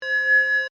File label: Scanner Short Read, Scifi, Scanner, Short, Read, Out, Science, Fiction; Tech; BlastWave